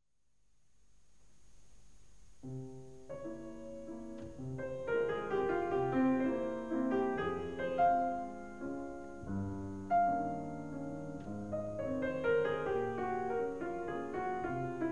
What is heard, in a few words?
chopin mazurka in f, pianist